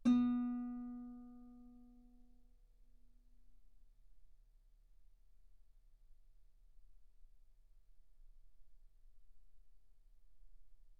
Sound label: Guitar ff B3; sul B